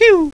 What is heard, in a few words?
pew